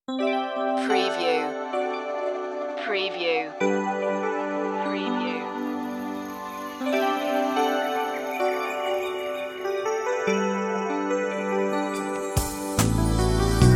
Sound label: classical romance